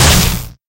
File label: hit thunder